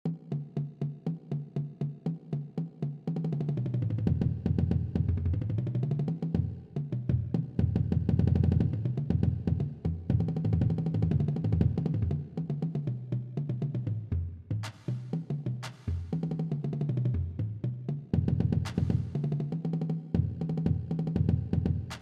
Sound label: Thumper Bass Cadence